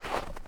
snow soft footstep; Minetest; VoxeLibre

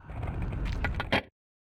shulker close, chests; Minetest; VoxeLibre

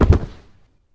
player falling damage

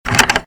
doors door open; Minetest; VoxeLibre

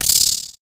spider hurt; mobs; mobs mc

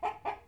chicken buck; Minetest; VoxeLibre; mobs mc

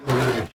bear attack; Minetest; VoxeLibre; mobs mc

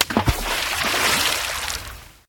place node water; Minetest; VoxeLibre

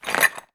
armor unequip iron; mcl armor

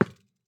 bows hit other; Minetest; VoxeLibre

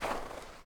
snow soft footstep; mcl sounds

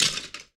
skeleton hurt; mobs; mobs mc

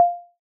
amethyst walk; mcl amethyst

